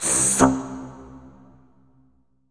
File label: TSOOK, Tubevox Wet; Effects